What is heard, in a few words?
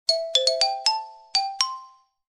Texting alert